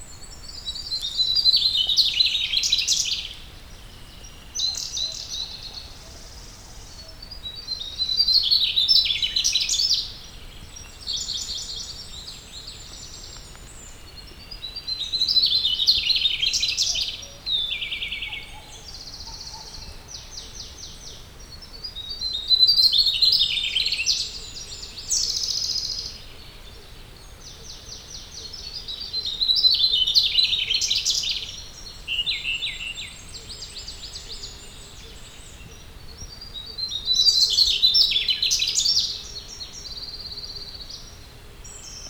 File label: mugimaki flycatcher heilongjiang